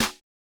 Snare Hit; Hip Hop Exotica; Apple Loops; Big Fish Audio

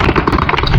Dirty; Cymatics; Foley Wavetables Vol 1; Serum

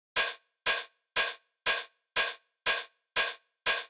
Big EDM Hat Loop, 120 BPM; Future House Tools; Big EDM Drum Loops

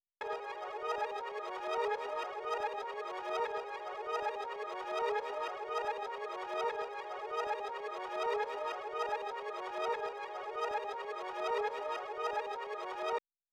148 BPM G# Min Reverse Textures; Cymatics; Destiny Gold Expansion Stems; Seven Deadly Sins

